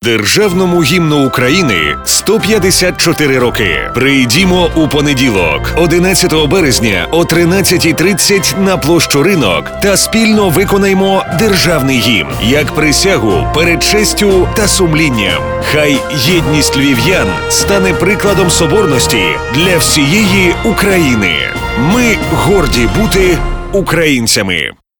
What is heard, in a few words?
Himn